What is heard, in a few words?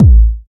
kick drum sample a key; kick drum samples